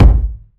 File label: low tone kick drum sound g sharp key